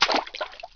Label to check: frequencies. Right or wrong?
Wrong